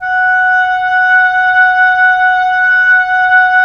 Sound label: WND OB P, Oboe p; Roland - LA Composer vol. 4